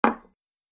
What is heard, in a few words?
bowl put in table